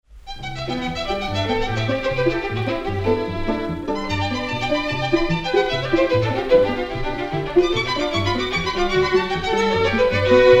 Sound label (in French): Quatuor à cordes en mi majeur Allegro vivace; Villa-Lobos; n° 6